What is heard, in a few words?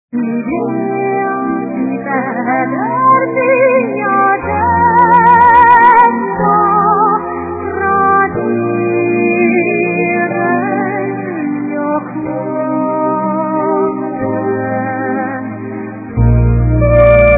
notturno